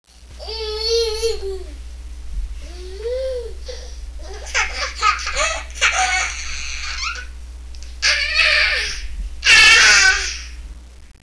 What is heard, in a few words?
laugh